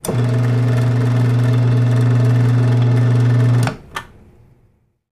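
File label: Dentist Chair Steady; seats & stools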